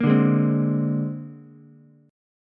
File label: C 7th Suspended 2nd Sharp 5th, C, down, Ukulele; C7sus2#5 strummed; JGuitar